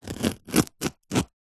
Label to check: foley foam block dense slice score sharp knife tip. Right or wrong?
Right